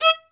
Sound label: Synclavier Strings, SOLO VI; ILIO